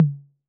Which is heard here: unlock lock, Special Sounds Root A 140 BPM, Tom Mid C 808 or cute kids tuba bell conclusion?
Tom Mid C 808